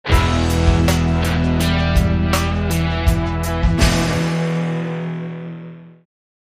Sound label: Modern Blues, Sting